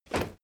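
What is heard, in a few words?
step crowd run loud single right, footsteps; schritte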